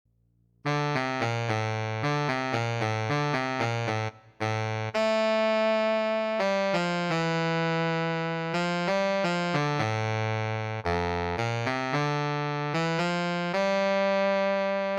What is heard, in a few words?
Baritone Saxophone Lyrical